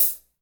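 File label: hi hat; Best Service Gigapack 2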